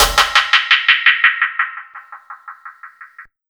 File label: TR DELAY, Ragga & Dub Reggae; USB Soundscan vol.27; AKAI